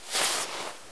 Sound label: sleeve generic, weapons